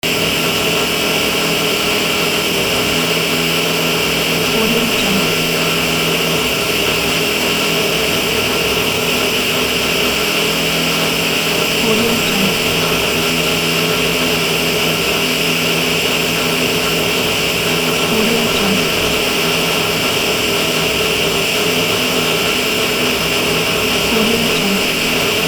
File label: drill; افکت صدای چرخش مته برقی; urban sounds